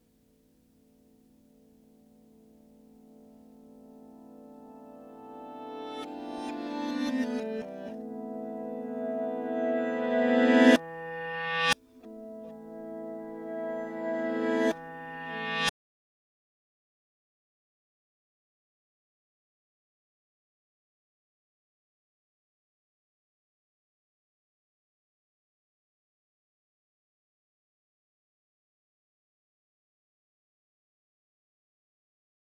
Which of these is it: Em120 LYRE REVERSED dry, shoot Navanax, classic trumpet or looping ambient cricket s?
Em120 LYRE REVERSED dry